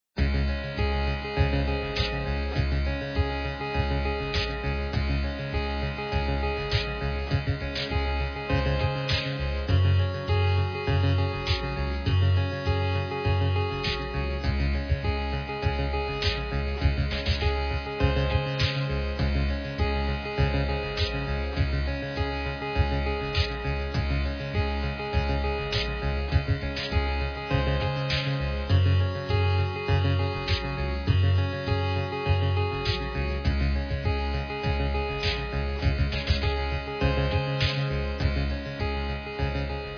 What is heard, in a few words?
bgm